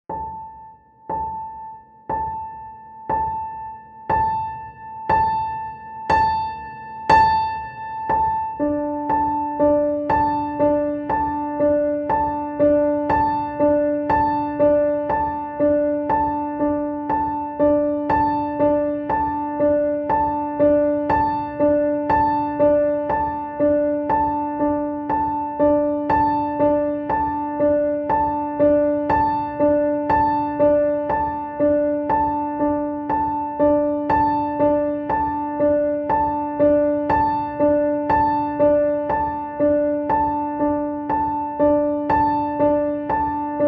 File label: apple piano piano